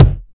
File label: clean kick drum single shot f sharp key